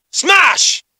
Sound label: smash; potry v2; shulk